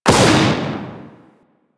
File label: Raptor Mortar Launch; Warframe